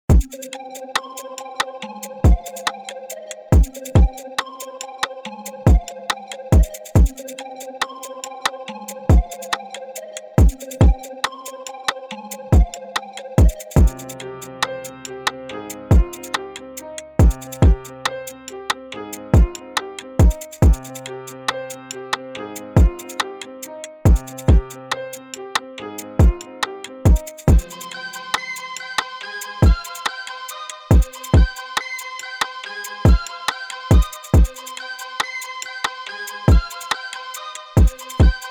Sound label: keys; tetrad